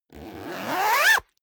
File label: zipper down jacket close accelerate